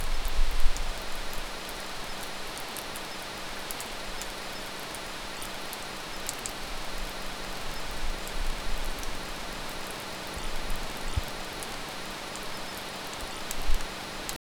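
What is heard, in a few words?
gradually it starts to rain, dripping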